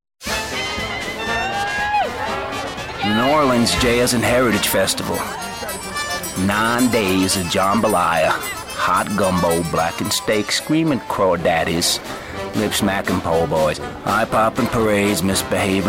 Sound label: Jazz; SAA Jazz & Heritage